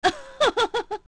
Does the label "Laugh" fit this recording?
Yes